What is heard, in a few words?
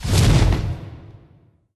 Hobbit Fire burst